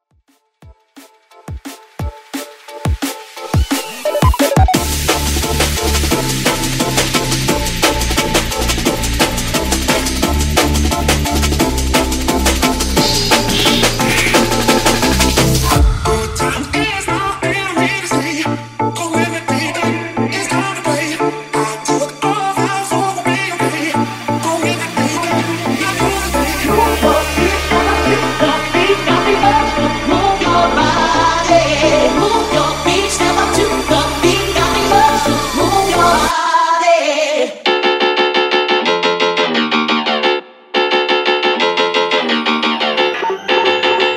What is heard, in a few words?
Drum Bass